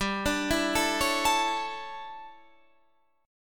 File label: D Major 7th Suspended 2nd Suspended 4th